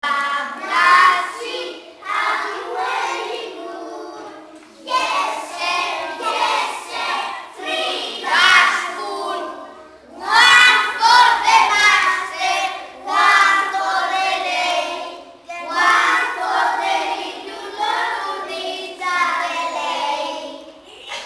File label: Ba ba black sheep